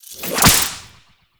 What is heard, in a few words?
Exalted Whip Unequip; Warframe; Warframes; Khora